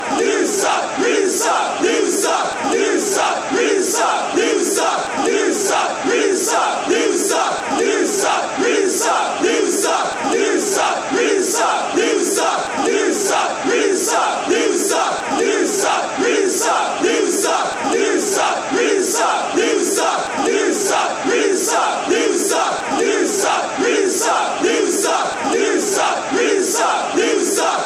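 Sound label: you suck, football chant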